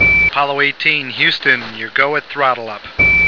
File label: throttle